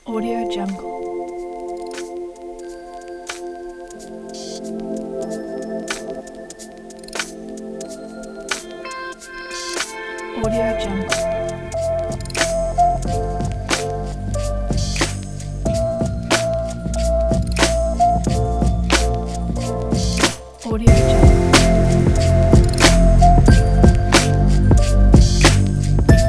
upbeat urban chill hop

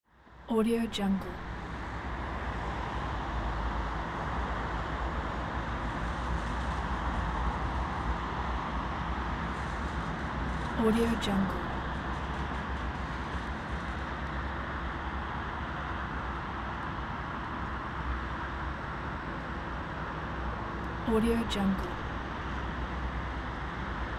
city night ambience

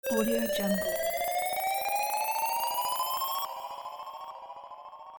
space rise effect

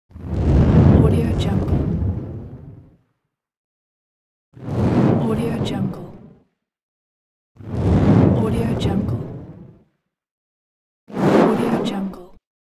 torch

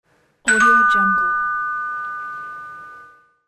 door bell

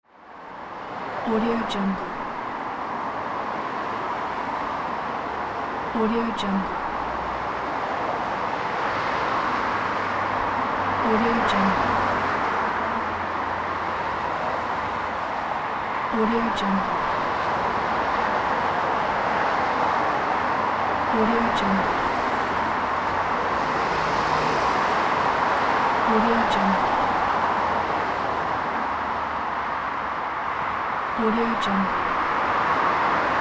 busy highway

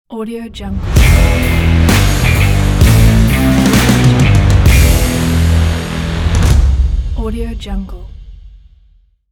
stomp rock logo

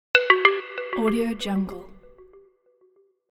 bonus sound; supersoundfactory

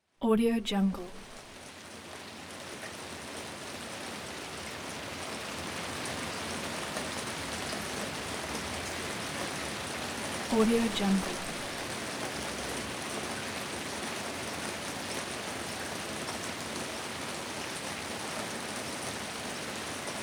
rain; robertbushmanfx

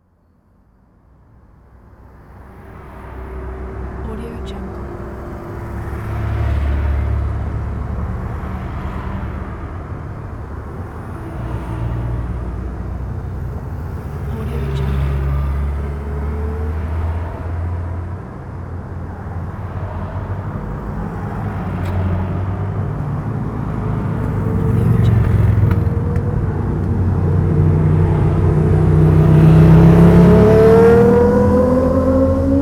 city ambience traffic

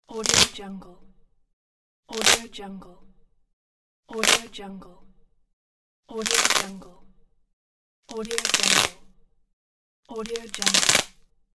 velcro

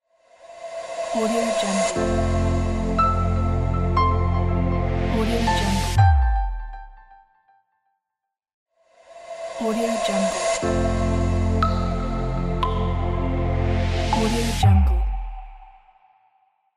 welcome logo

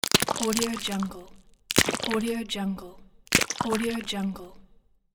nature crushing fruit

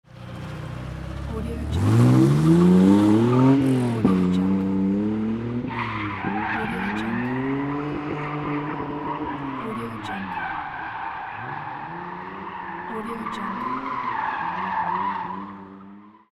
car start and drift

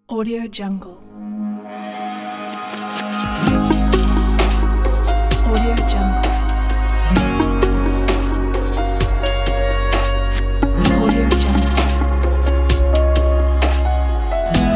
spring vibes logo; orangevibes

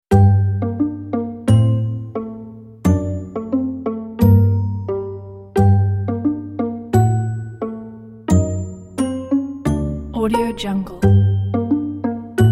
slow sneak; soundroll-music

